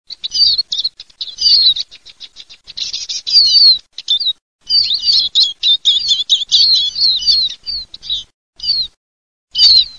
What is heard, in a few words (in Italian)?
LUCARINO VARIE